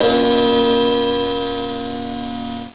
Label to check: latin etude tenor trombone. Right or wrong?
Wrong